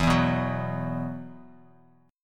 E Suspended 2nd E, down, Bass Standard

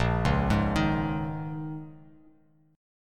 Bb Minor 11th Bb down Bass Standard; arpeggiated; JGuitar

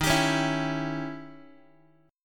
Eb 7th Flat 9th, Eb, Guitar; x,11,10,11,8,x; Eb7b9 strummed; JGuitar

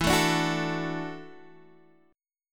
E 9th Suspended 4th E down Guitar Open D

